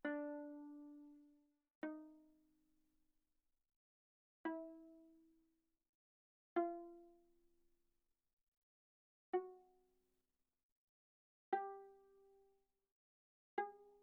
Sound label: Viola pizz sul D mf D4 B4